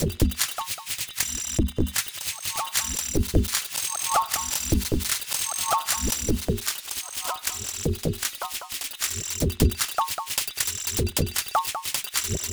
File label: wind Beat